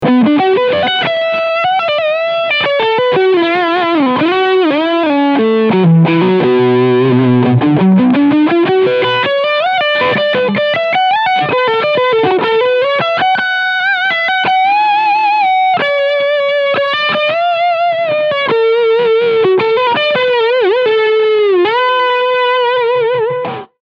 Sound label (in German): Strawberry Red DLX Full Gain EQ 12 Uhr; One Control